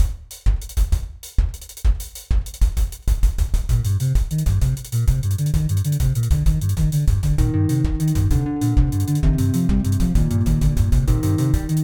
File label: shanty jig; lexys-labyrinth